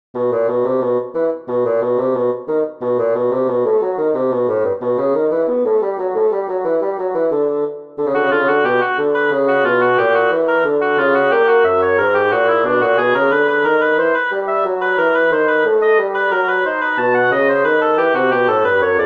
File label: fugue